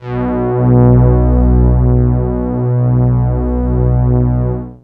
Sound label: Prophet V; instrumente